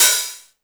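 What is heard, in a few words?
hi-hat, 135 BPM, drum & bass; Ueberschall; Drum & Bass Flash Trackz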